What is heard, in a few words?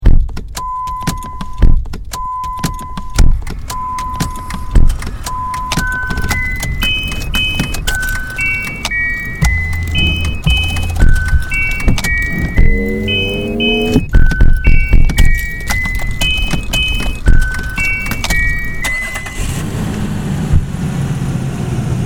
car noise